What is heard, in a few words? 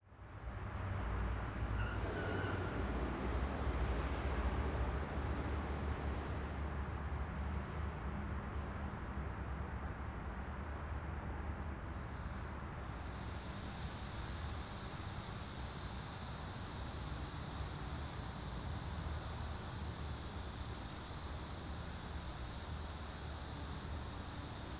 Ambience Traffic From Inside Manual Car Wash 180; AdobeCC